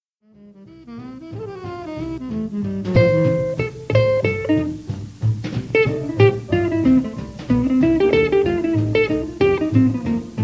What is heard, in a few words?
solo chords